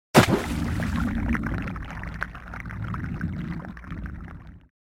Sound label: Athlete single dive underwater